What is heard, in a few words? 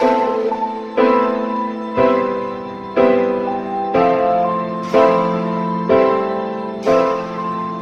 piano, sad loop